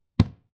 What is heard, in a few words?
Hit